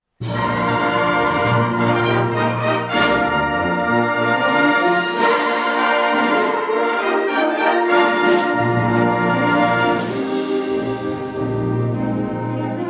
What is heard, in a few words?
fanfare and flourishes